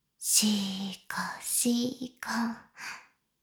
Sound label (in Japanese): しこしこ音声; 牧場戦腺; 少尉